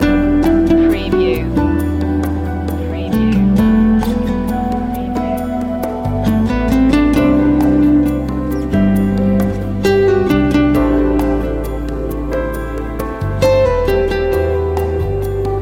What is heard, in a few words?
cafe vibes loop